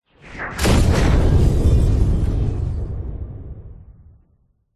explosion boom large with breaking glass more emphasis on the boom